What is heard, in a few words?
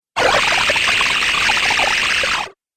Wacky Water Video Game